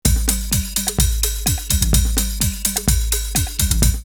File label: Kick, drumloops Techno House 127; KickSlave; X-Static Goldmine